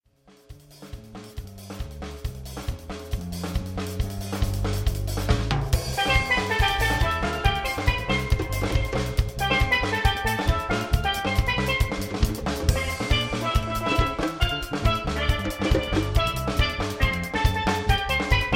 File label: Traditional Island